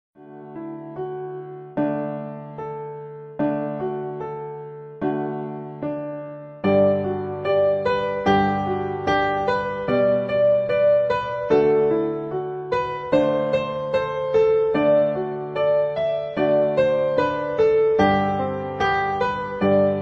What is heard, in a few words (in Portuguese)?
Marcha Soldado